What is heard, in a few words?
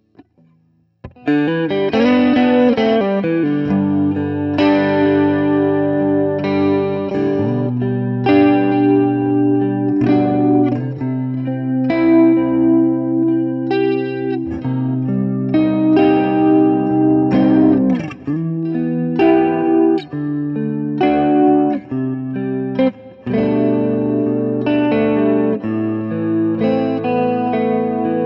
Gretsch ETMC LTD Pristine JET Neck Pickup